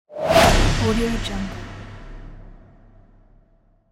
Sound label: massive title reveal